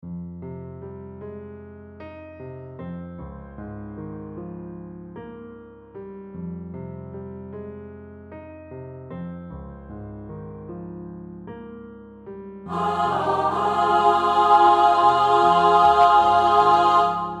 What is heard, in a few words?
Alto; Sanctus